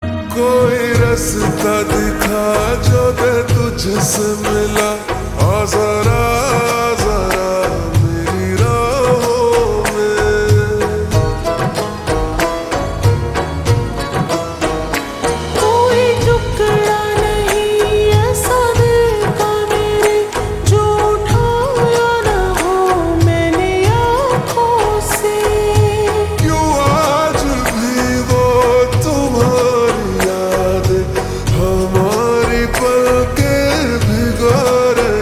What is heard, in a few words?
Ringtone Slowed Reverb